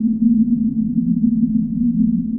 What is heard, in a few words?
FX Feedback Sus Loop